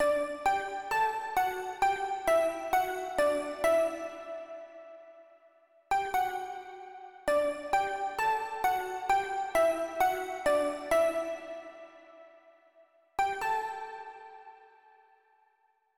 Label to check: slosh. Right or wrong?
Wrong